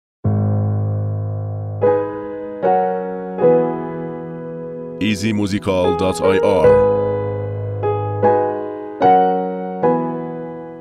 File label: Beat